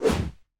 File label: aquarium boss kick